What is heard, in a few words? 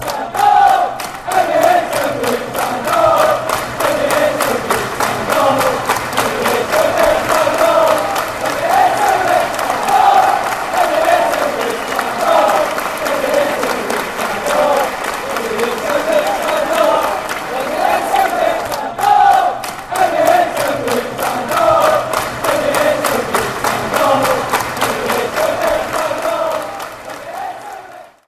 stand if you hate celtic, fanchants